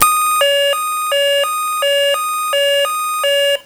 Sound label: star sensor activate